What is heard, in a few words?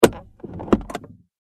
transportation car handbrake released; Cars; Vehicles; McKinney Sound